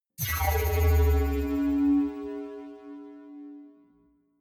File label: energy field vanish